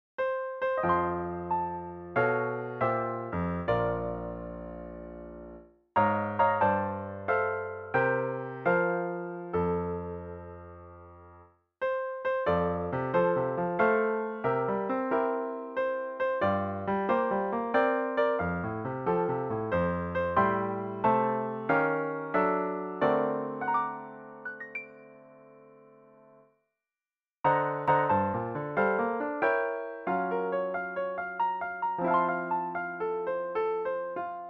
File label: Happy Birthday To You Piano Solo